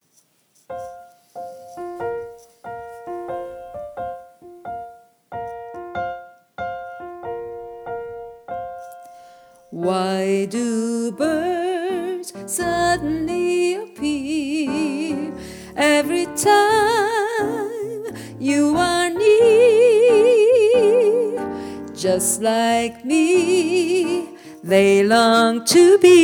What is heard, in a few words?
Alto